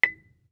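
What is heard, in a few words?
Marimba deadstroke fortissimo B6; MIS Pitches - 2014; Percussion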